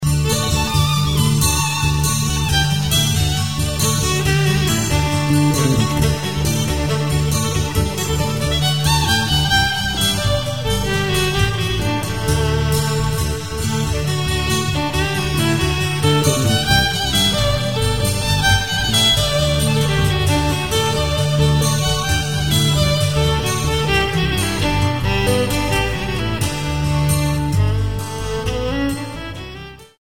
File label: Dreamscape